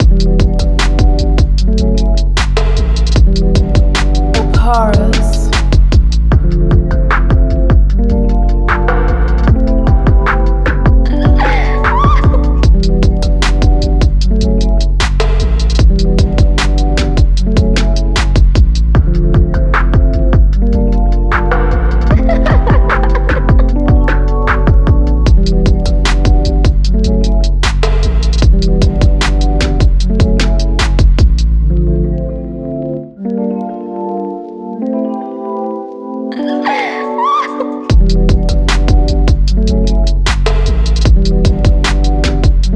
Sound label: 76 BPM